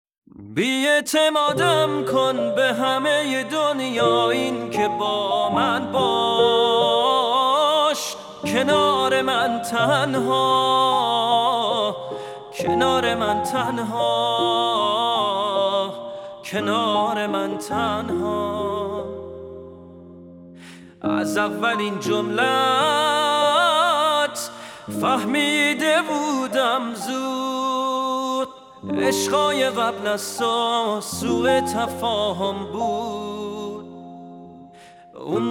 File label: Piano Version